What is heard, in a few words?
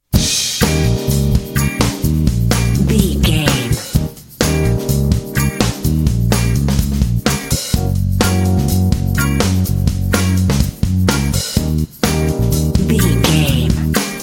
feel good funkers